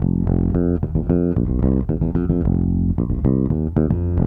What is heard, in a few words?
Raw Funk Bass; Sonic Foundry (Sony Creative Software); What it Is! 70s Analog Funk